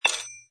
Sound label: pick